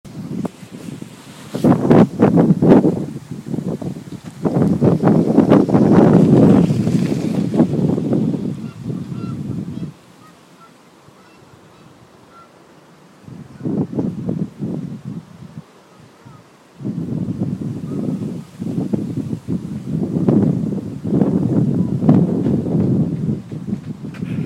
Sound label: listen to the wind; walk 8 kew bridge to chiswick bridge